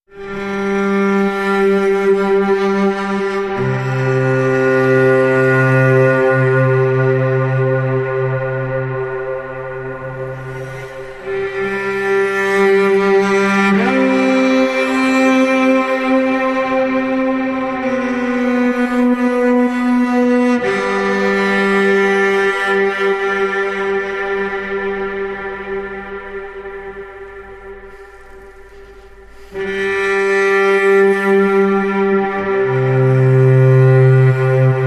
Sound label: Cello